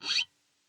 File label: scrape, glowly bass